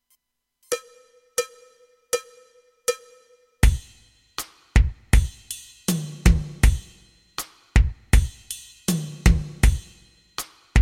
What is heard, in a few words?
latin basic; latin basic rhythmen